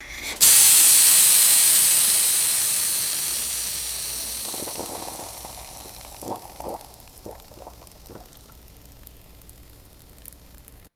water sizzling on hot iron

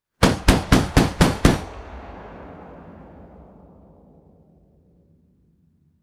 distant rapid anti-aircraft cannon shots; guns

